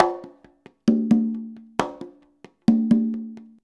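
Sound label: 133.5 CGA, conga; Univers Sons; Basicussions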